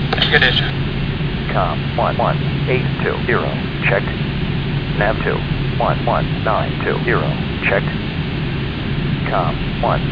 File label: keyboard; keyboard input